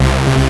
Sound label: RAVE BASS; SoundFactory; SMPMANIA